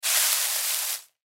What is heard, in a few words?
Hay Movement; Hay Movement Object; Farms; Backgrounds; Foley; SoundEffects-Blastwave